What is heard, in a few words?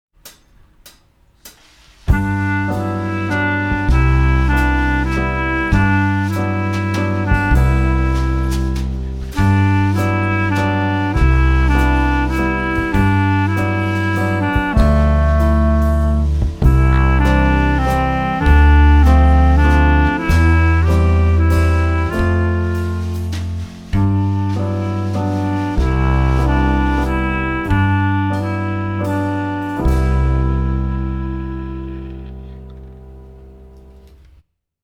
Smooth Waltz, jazz wind